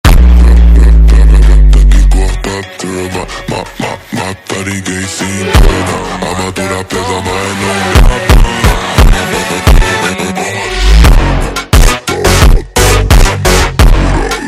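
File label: super Slowed, phonk music; funk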